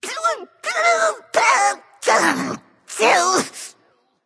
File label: grumble; smite; scylla